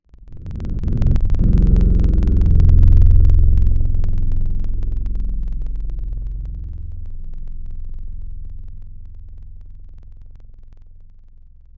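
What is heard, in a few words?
strings Cello Piz, Wavetables; Synthetic's Serum Collection; Standalone-Music SYMPHONY Wavetables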